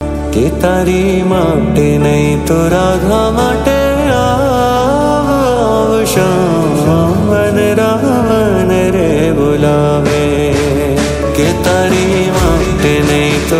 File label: gujarati ringtone